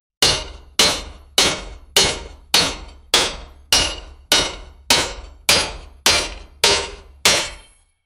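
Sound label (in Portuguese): preparação de guerra tambor; swords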